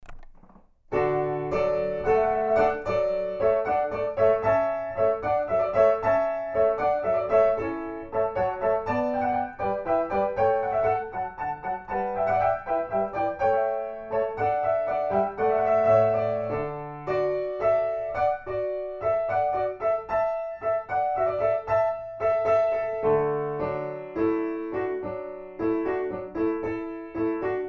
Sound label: Water Music (Alla Hornpipe); Handel